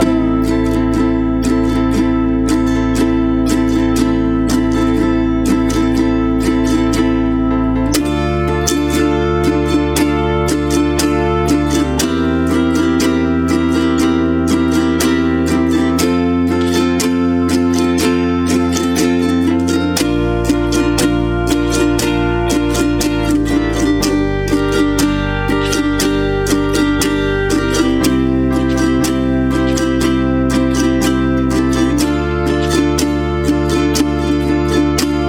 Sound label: guide track VERYsolo slow 60bpm no lead